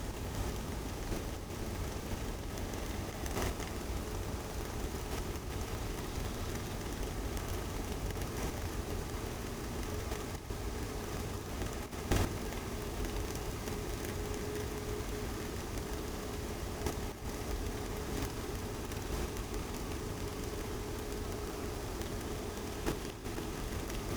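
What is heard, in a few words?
hydrophone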